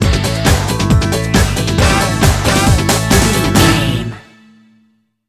bumper, Dance, EDM, Club, Disco, Eurodance, Confident, Energetic, Cheerful; Exercise, Aerobic, Aerobics, Spinning, Workout